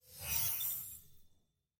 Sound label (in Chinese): 季后赛 上方logo转场; nba